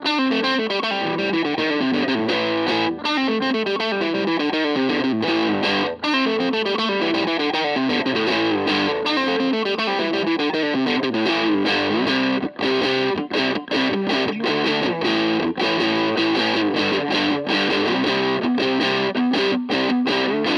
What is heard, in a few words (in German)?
Harley Benton Vergleich HB Fuzz; Maybach